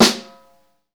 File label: snare, Roland